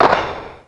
Clap, Ensoniq ASR-X Pro

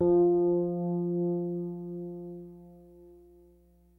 DYNO RHODES, E-PIANOS; Kid Nepro - Elite

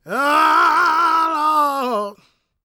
GOSPEL MALE; Spectrasonics Vocal Planet

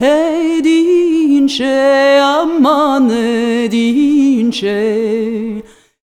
VOICES; USB Soundscan vol.63; AKAI

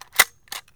EJECT; Deadly3D; E-MU Producer Series Vol. 5 – 3-D Audio Collection; 3D Percussives

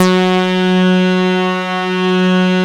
CS-15, BASSES

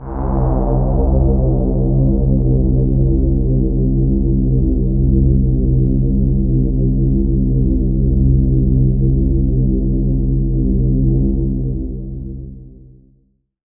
Atmos C1, Club Techno; Zero-G

